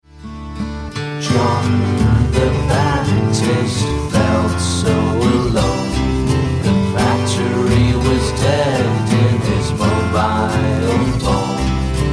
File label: Blues